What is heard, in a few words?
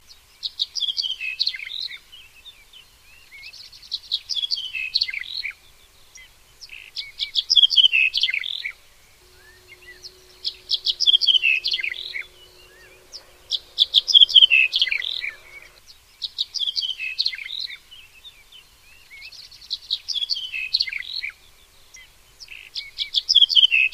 emberiza pala